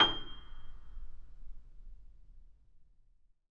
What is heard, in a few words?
Mp G 0 off, Grand Piano off mic